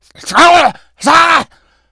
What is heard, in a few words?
shout, infected; npc, alert, becomealert